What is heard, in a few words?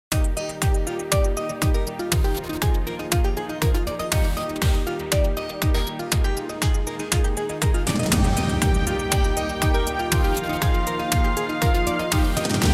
BRIGHT NEW TOMORROW NEWS